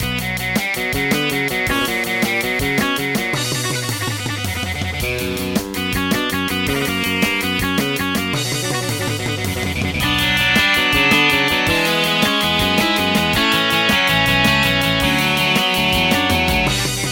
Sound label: Sinfonia 15 in B minor BWV 801 poprock